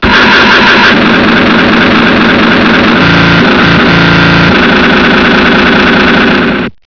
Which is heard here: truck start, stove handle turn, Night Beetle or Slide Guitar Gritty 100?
truck start